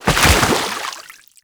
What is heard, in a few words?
Roll Forward Foley Water E; Enemies; Warframe; Teshin; DE